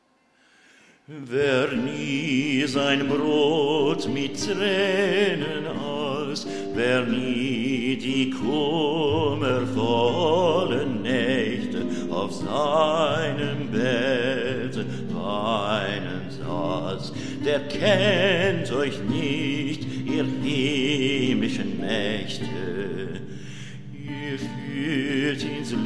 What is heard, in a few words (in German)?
klage bassus mix